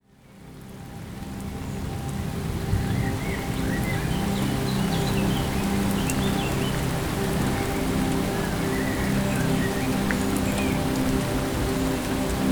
Rain; Nature Sounds; Piano; Purifying

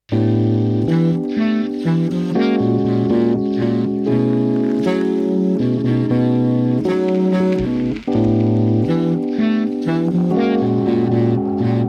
Clarinet

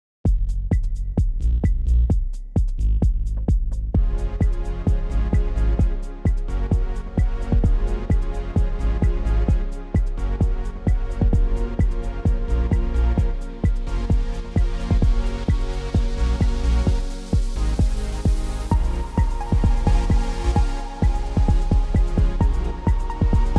Acid Bass

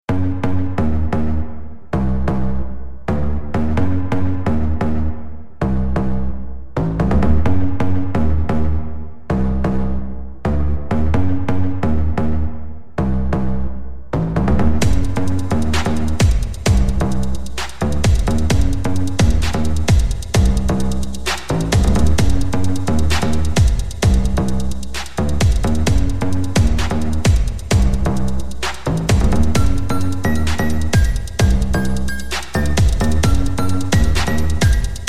Brazilian phonk 8D

